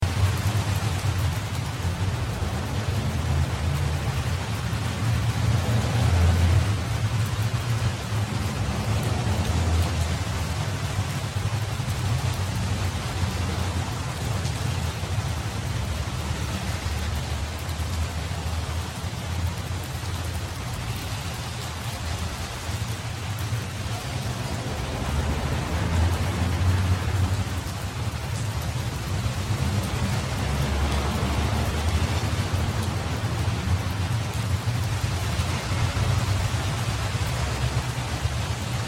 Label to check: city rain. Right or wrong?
Right